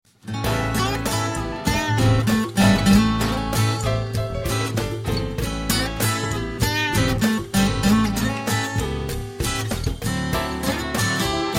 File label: MARRIS D-220M